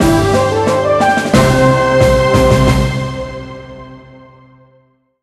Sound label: victory